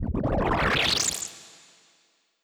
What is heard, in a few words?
regrowth